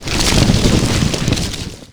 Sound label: earthquake